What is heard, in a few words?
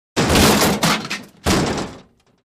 Car Crash Small 2 Impc